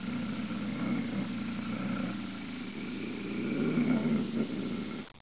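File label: mountain lion; 12000 Graphics Pack - A Complete Graphics Collection For Your PC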